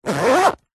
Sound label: office pencil case zipper